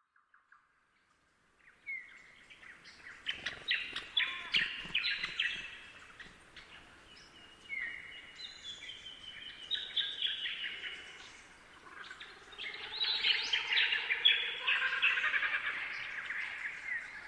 thornbills - kookaburra - magpies - butcherbirds chirping